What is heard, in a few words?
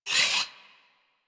Moa Leg Movement; Enemies; Warframe